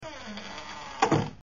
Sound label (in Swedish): Dorr stangs